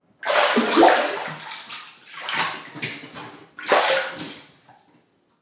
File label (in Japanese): 風呂から出る; 日常生活の効果音